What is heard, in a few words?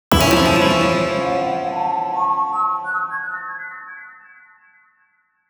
jingle Mission fault